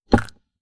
Stick

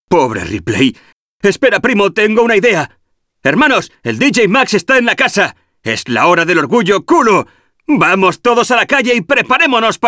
Bonus; Arthur and the Revenge of Maltazard; Wii

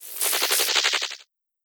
Jelly

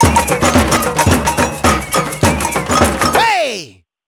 Soccer Victory Dance; rayman raving rabbids playstation 2

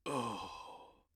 Hand Raise

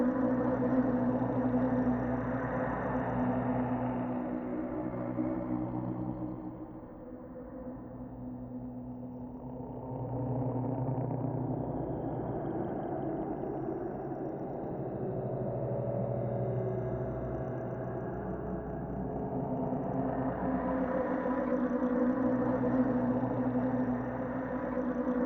Drone

